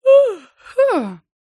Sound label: human yawn female 30 years old